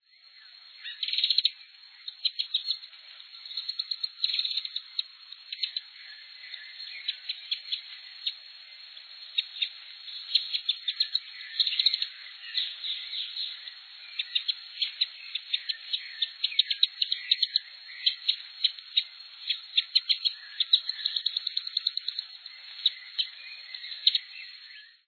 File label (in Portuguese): saira-de-papo-preto